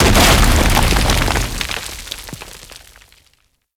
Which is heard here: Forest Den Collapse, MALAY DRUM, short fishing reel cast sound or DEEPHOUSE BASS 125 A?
Forest Den Collapse